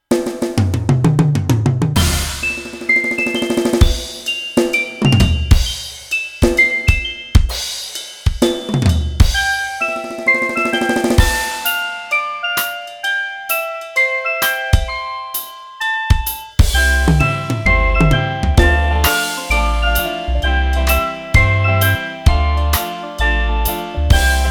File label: i-mobile Ringtone Bossa; i-mobile i-STYLE 216